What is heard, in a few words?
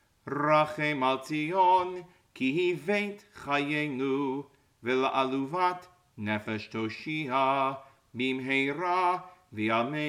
Blessings After Haftarah